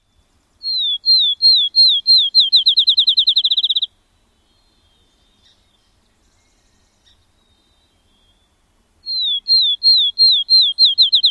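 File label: song, Field Sparrow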